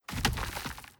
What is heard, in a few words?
Hydra Stone